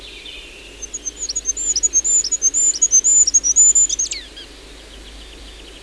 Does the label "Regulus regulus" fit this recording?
Yes